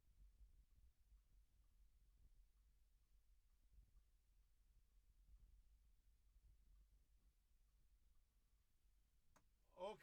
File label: Drums